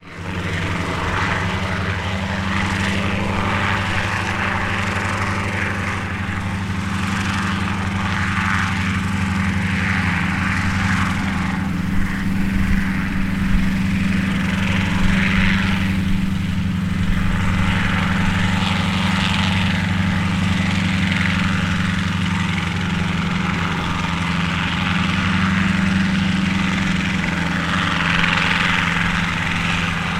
helicotper hover low distance wind grass

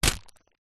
wet wipes packet catch in hand; household; zapsplat

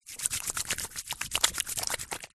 human hands wet rub together; zapsplat

